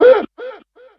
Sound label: Death; Kilta